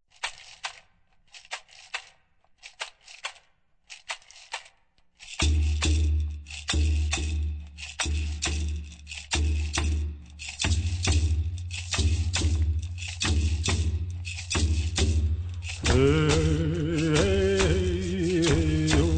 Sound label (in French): Chant des ancetres, Chants dans la Tradition Amérindienne